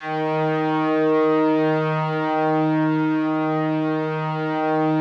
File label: D#2, STRINGS; Yamaha VP-1; Music Store; JODYSTRINGS